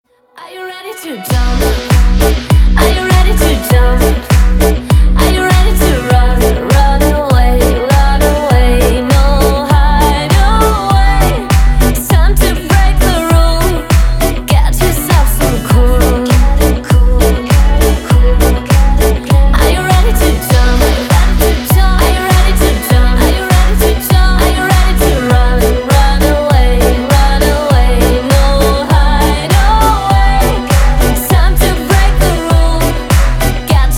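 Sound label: popular music for call, ringtone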